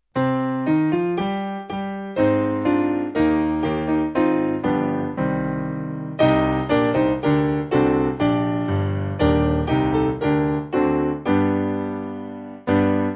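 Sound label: Piano, Music Backing Files for Churches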